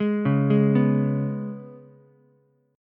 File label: Ab Minor Double Flat 5th Ab down Ukulele; arpeggiated; JGuitar